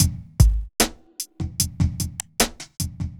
evil beats

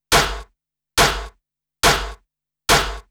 Ensnare Loop, Dance, Beats

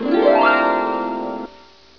HARP

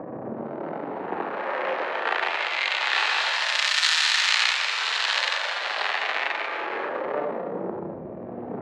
Noiz; Dance; SFX

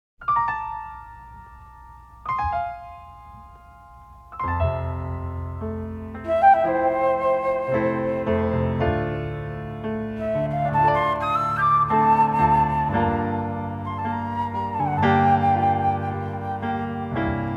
instrumental

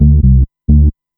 bass, dance

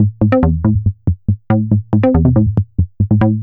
bassline, dance

